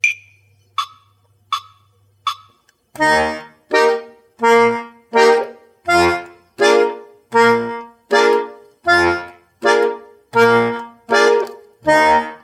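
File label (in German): Begleiten in Es Dur Übung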